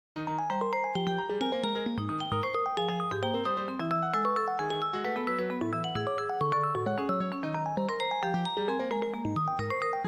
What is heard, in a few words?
harmony example